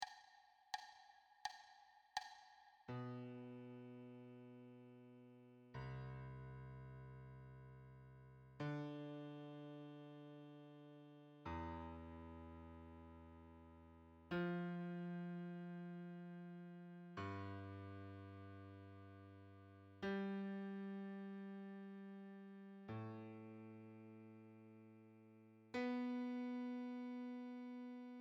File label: C whole notes solo